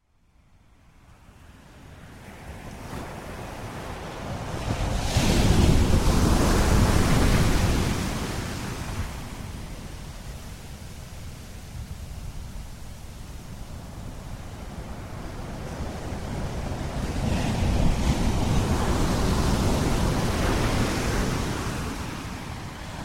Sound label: tangalle waves dawn